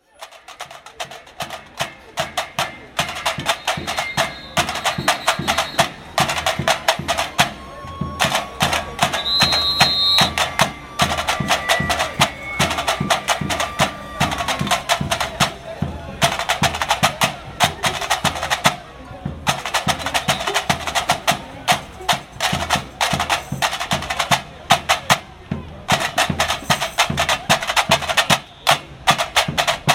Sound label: surdo tamborim